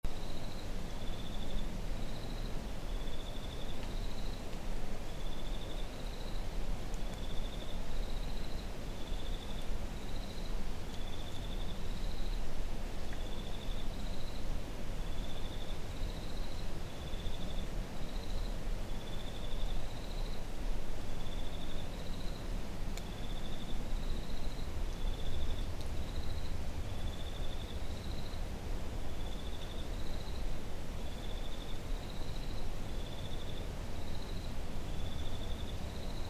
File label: forest sounds